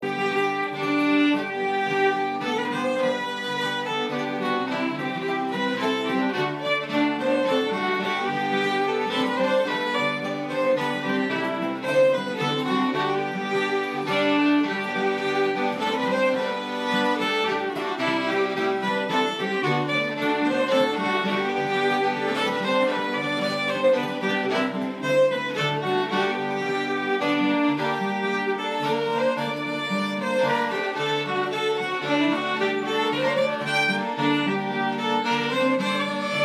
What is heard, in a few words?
Mazurka